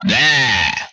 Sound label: death, vocal; retro arcade; MMRetroArcadeSoundsPack